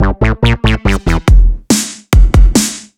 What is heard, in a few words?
SFX Pass It Jingle; BopIt SFX PassItJingle